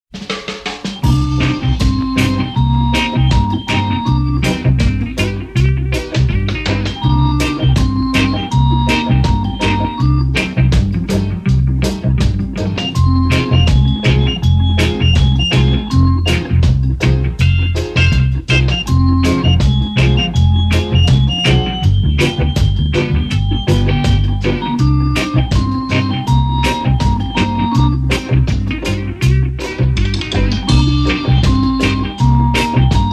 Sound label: Reggae Rock, Dub